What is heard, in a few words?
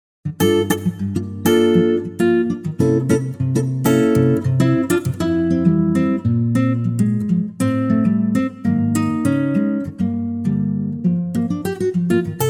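F acoustic guitar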